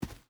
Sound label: footstep boots sand run; Rust